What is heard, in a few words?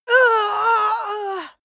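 female combat hit hard, screams; schreie; menschen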